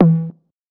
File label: 808s